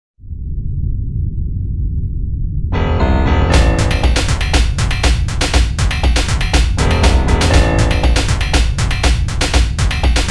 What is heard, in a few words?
Crime Drama; Soundzabound Volume 3 Disc 2